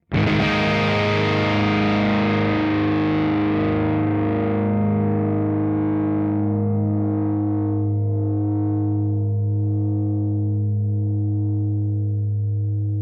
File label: Cort MBM 1 Peavey both sustain